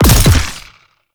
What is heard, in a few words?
Ink Rifle Alt Shot; Warframe; Tenno